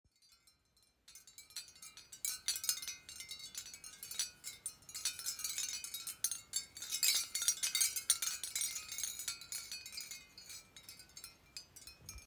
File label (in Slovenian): Vetrni zvonček capiz školjke